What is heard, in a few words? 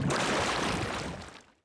water monster walk 2 run; DAoC